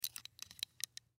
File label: Sun Glasses Open; Clicks (Sound Effects:Objects); Blastwave; Foley